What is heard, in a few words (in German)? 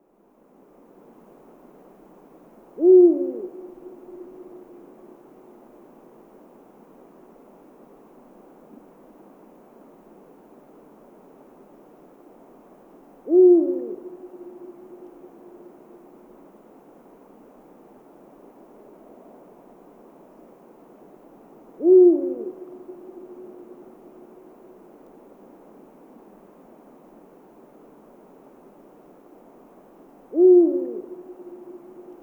Uhu Ruf